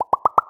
Special Pop; ArmyRaid; Interface and Item Sounds; Pops

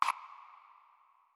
Future Sounds, Interface; ArmyRaid

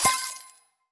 Special & Powerup; ArmyRaid; Interface and Item Sounds; Items & Collectables

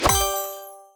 Special & Powerup; Items & Collectables; ArmyRaid

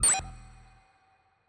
Future Sounds, Interface Futuristic; ArmyRaid

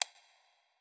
Future Sounds, Interface; ArmyRaid

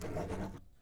Writing; ArmyRaid; Interface and Item Sounds

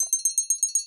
Count Prize (short); Items & Collectables; ArmyRaid

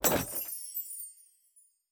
Special & Powerup, Interface and Item Sounds; ArmyRaid

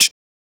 Mouth Interface; ArmyRaid; Interface and Item Sounds

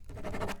Writing; ArmyRaid; Interface and Item Sounds

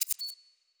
Future Sounds, Futuristic Interface; ArmyRaid

